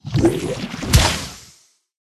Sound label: Frog Death; Kingdom Rush Vengeance; tower defense